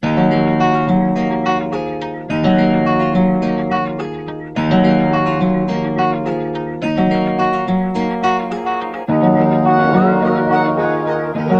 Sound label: Tarantino - 106 BPM D# Min, Melodies Dark; Cymatics; ZODIAC Beta Pack